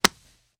Hand Grabs, Slaps, And Pats; Flesh Slap To Face With Medium Crack.